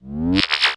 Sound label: Restore Up; Unreal